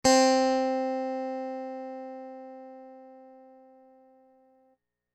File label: Clean